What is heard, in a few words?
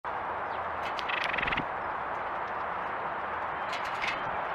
White breasted nuthatch call; usa; the acrobatic marvel of the usa